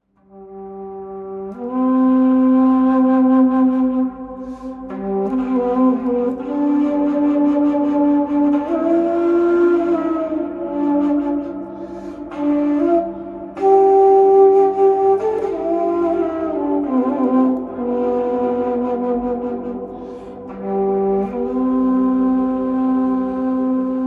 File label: shankh